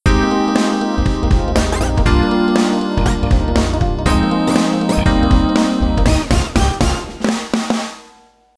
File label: Pop